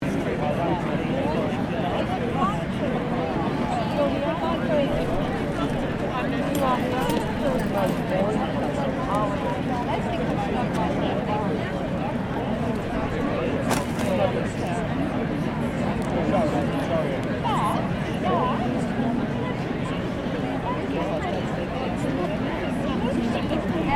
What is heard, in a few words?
Crowd Large Outdoor Event Chatter, Outdoor event crowd; Crowds; Backgrounds